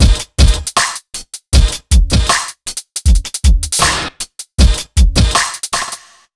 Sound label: BT